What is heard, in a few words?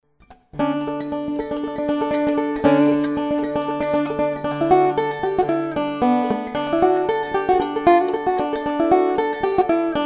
Medley